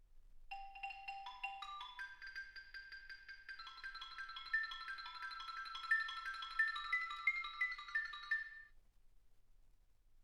xylophone

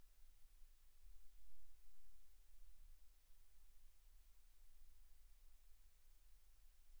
silence 48kHz

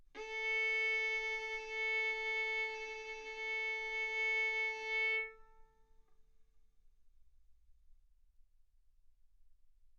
Violin arco A4 B4; mf; stereo

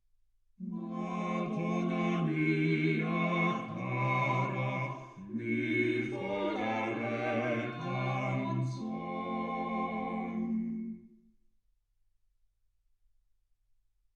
quartet

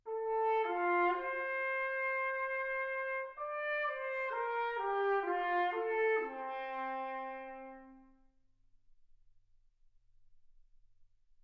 trumpet

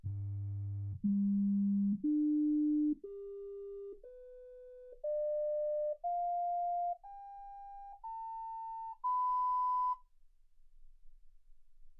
sinus tones 48kHz

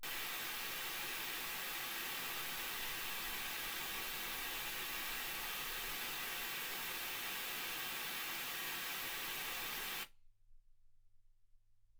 wgn 48kHz

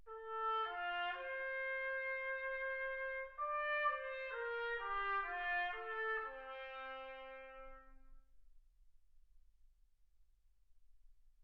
trumpet

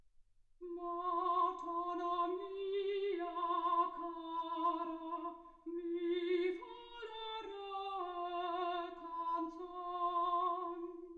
soprano

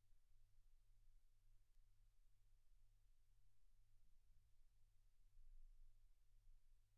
silence 48kHz

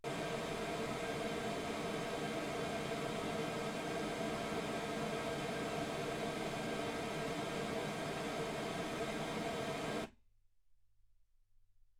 pink noise 48kHz

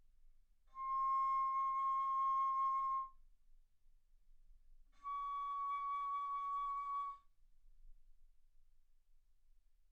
Bass Flute ff C6 Db6

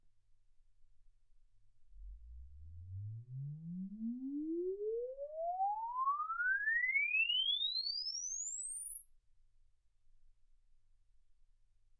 exp swept sinus 10Hz 24kHz

